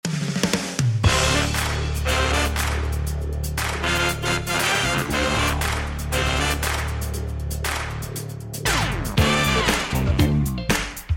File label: no vocals